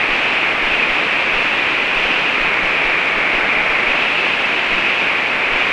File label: wind